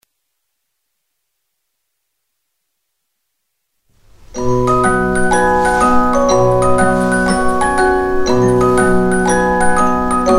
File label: orgel